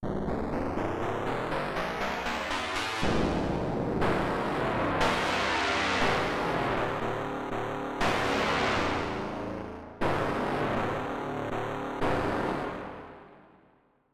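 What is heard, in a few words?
Wet Guitar Pad, fuzz guitars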